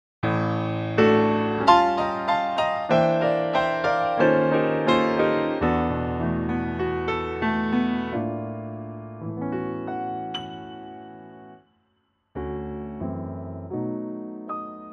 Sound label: D trio